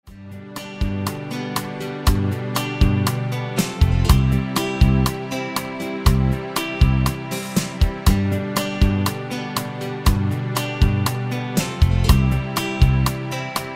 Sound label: Guitars